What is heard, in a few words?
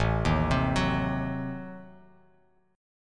A Diminished 7th A down Bass Standard; Adim7 arpeggiated; JGuitar